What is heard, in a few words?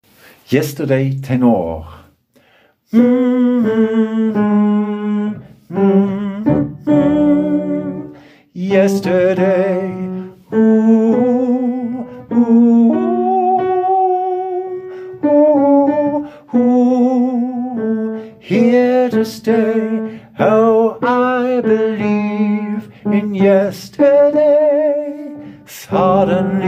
Tenor